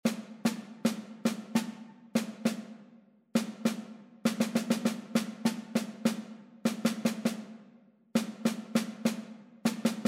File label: rhythmic error detection